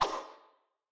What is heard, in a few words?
anime whoosh